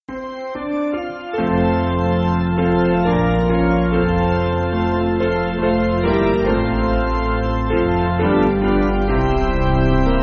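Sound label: Piano, Music Backing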